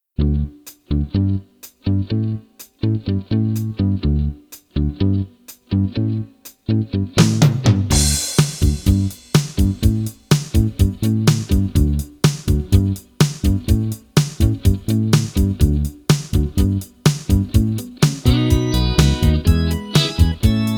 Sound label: backing track